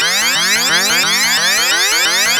RED ALERT, SPACE

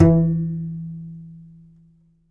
C. Bass Balalaika, Plucked Strings

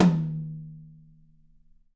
YAM8X10 L, Drums; Big Fish Audio; Ross Garfield Drums VOL-2; ST LOUIS KIT

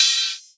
Rides, Cymbals

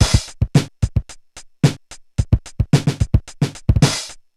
Korg MR-16 (110BPM), beats; musicradar; cyborg beats

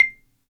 Marimba, -; Roland LCDP11; Africa VOL-1; Af.Marimbas

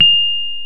PNO, Rhodes Mk.V; Keyboards of The 60's and 70's

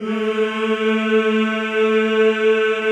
mix combi; Sound & Vision - Gigapack I CD 2 (Roland); Combinations

